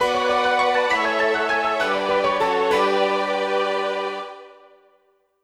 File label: Harp; Brandish 4; SC-88Pro